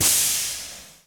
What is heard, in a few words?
burn